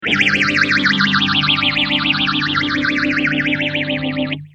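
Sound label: guitar Mutron biphase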